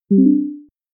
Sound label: charged; China Telecom